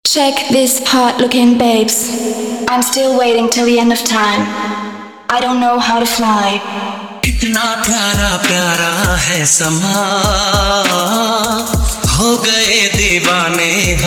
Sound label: Sound check Full Trance Mix